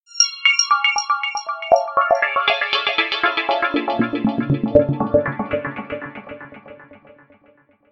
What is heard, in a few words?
arpeggios sequence